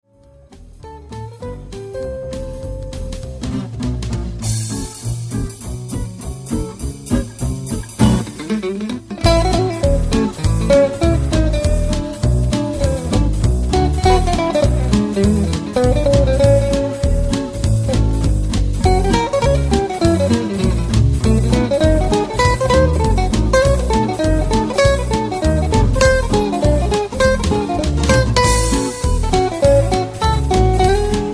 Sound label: Jingle